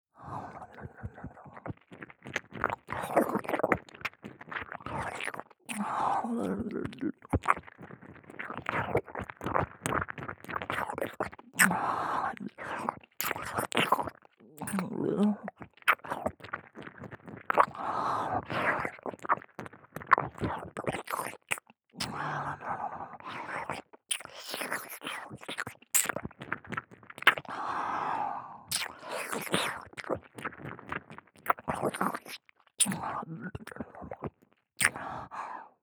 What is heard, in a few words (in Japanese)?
耳舐め （左後方）; ヒルダ